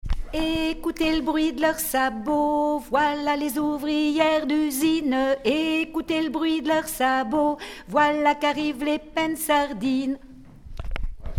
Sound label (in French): Penn Sardin 3eme voix sur refrain